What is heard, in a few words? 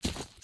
step earth